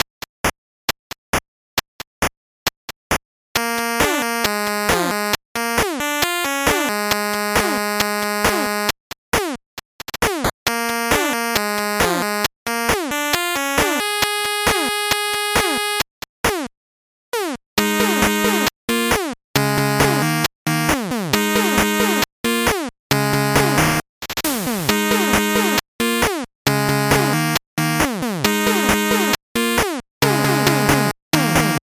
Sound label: 8bit bgm fast